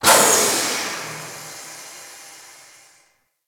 Settlement Valve Open; Warframe; Grineer Settlement

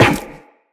Run Zipline, Footsteps; Tenno

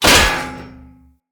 Great Sword Melee Impact Metal; Weapon Impacts; Player; Swords; Environment; Founders Great Sword

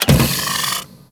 Extending Bridge Start; Warframe; Corpus

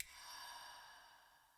Map Hover C

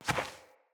Fawn Step; Encounters; Animal Rescue

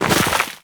Walk Deep Snow, footsteps; Warframe; Operator

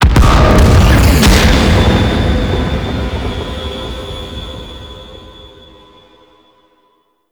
Destroy Explosion; Gameplay; Mission Three; Murex; Warframe; The New War

